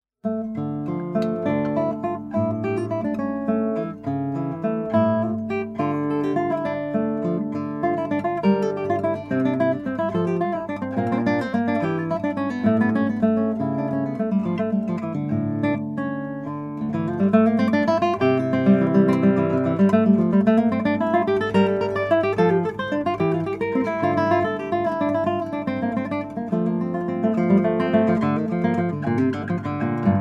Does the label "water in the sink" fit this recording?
No